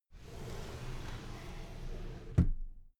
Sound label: drawer cabinet paper close soft